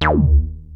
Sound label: OB D#2, Rare Synthesizer; VOX; Hollywood Edge - Giorgio Moroder Rare Synthesizer Collection